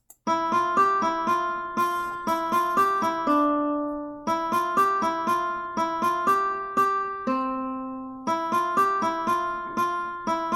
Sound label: Uke 1; Shepherd's Hey